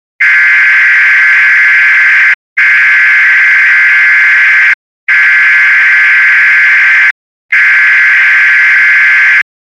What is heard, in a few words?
alert siren